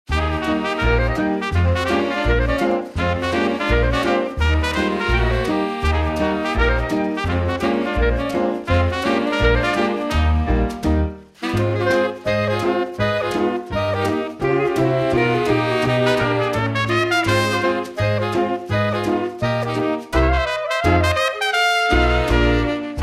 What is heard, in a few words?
Instrumental; Nick Jr Radio